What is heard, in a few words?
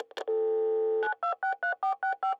computer modem; Singles Flirt Up Your Life